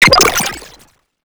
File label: organic sfx